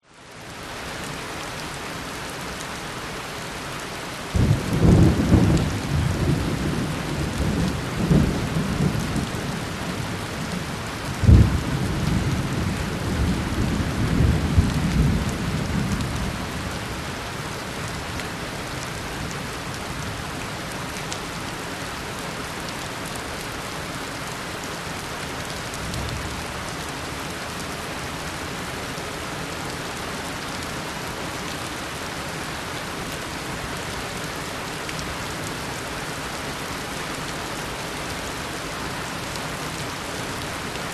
rain heavy on concrete with thunders kuala lumpur malaysia; lukas tvrdon